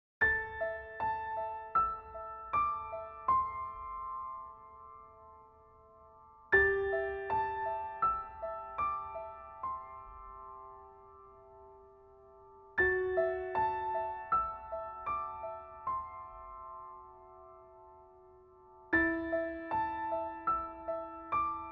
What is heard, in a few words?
Heartbreaking